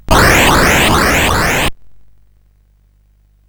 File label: sid CSG8580R5; hvsc